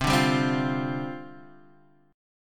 C Suspended 2nd Suspended 4th, down, Guitar, Drop D